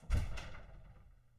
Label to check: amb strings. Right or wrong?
Wrong